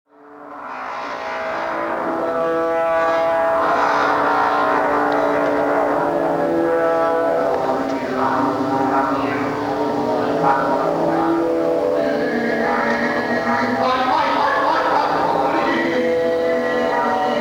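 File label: Prayer for the Living (For sprechstimme and four trombones); voice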